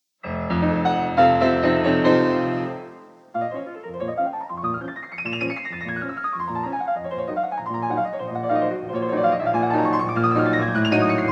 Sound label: Prelude B flat minor; F. Chopin; 24 Preludes, Op 28